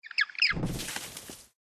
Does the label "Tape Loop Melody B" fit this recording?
No